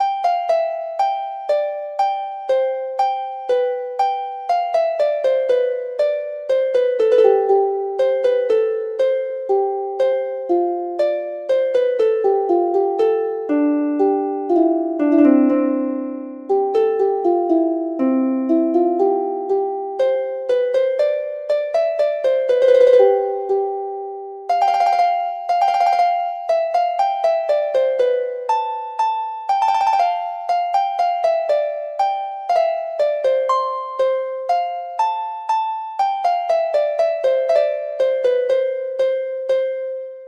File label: harp